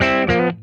C-G PASS, Funk Guitar; Zero G - Funk Guitar

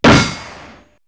Destruction, perc fx; X-Static Goldmine

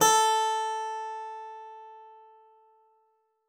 SPIN G#4, spinet; Xsample VOL-7

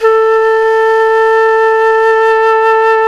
Jazz Flute

